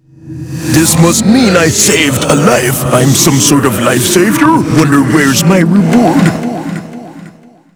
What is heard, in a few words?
kill; slender; xavier